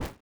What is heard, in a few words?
step grass